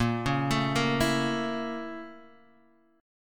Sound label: A sharp Minor Major 7th Flat 5th, Guitar Half Step Down